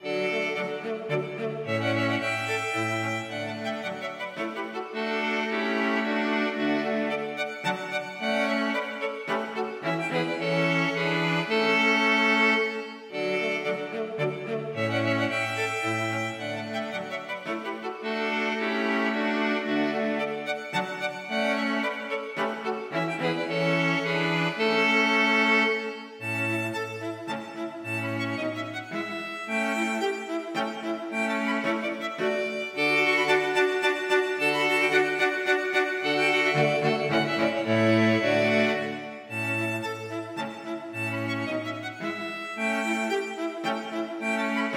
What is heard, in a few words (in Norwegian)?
Menuetto for strykekvartett; Collett